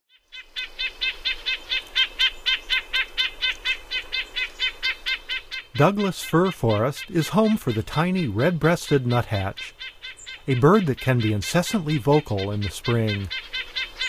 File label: Red-breasted Nuthatch; North America; Common Western Species; Know Your Bird Sounds